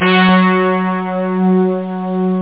trumps; 8,363 sample rate; 2 seconds; Amiga 8-bit Sampled Voice; shockwave

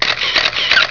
CAMERA; KABOOM! - Wild and Wacky Sound Software

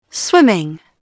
swimming, frog; Dorling Kindersley My First Incredible Amazing Dictionary

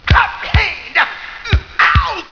Cupcake

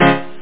power piano

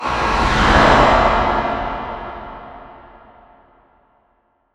cloud; wob2 demo

